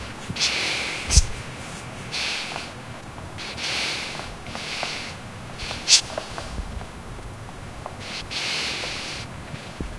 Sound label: cloth rustle cat being gr